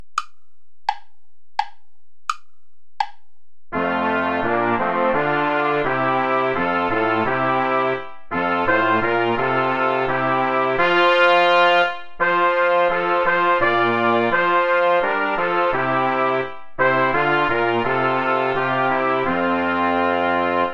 lord sing with lips and heart play along without trombone